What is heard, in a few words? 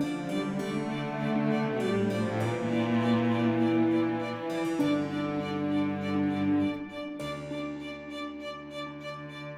prebattle light tension; Shadowhand; macos windows gamerip 2017